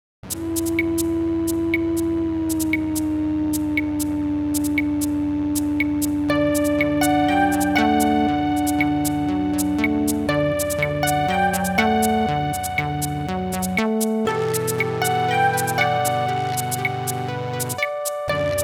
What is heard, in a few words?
MIDI blues